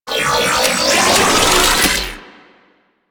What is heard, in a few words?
Hand Rocket Launcher Charge; Crp Hand Rocket Launcher